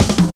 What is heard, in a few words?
Hard Edged Cut, Drum Loops; Zero-G Jungle Warfare 1, 2 & 3